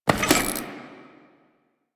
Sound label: Sticky bomb Reload; Grineer; Veilbreaker; Vor